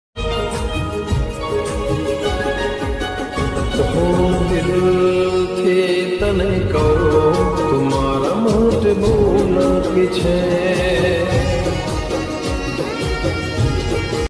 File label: gujrati ringtone